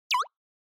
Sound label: Anime Motion High